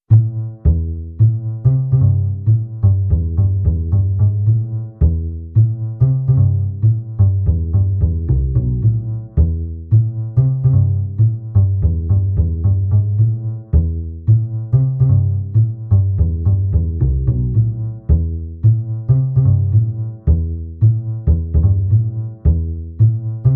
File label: bum bum back track 220bpm